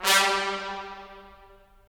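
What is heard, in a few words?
brass trumpets staccato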